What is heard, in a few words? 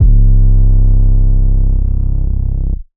Bass